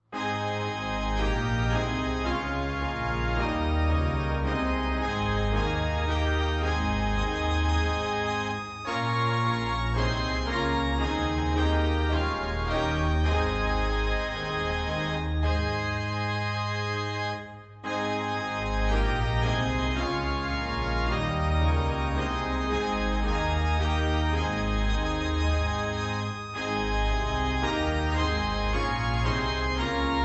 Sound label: SDA HYMNAL PRAISE AND WORSHIP SONG TUNES